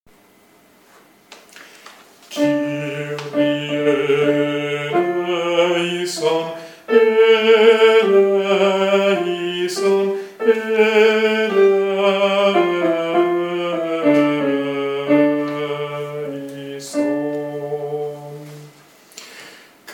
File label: Kyrie Contralti; Lotti; repertorio polifonico